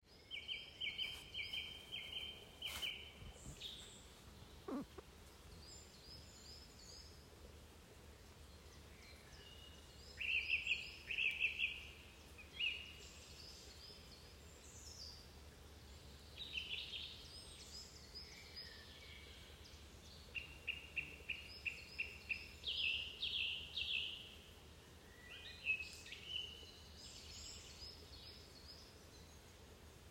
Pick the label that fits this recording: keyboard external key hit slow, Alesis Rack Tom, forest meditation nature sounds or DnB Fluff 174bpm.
forest meditation nature sounds